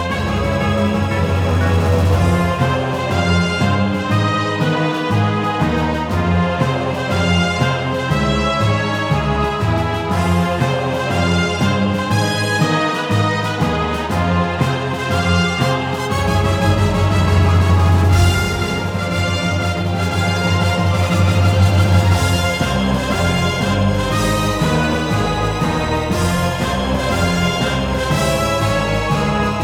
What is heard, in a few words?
combat